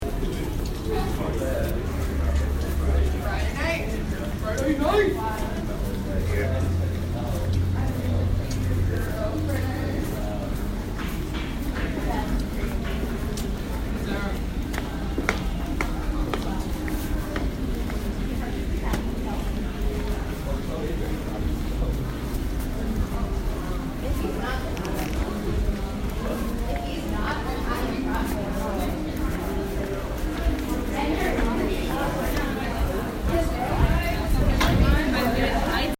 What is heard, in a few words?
Going Over The Unispan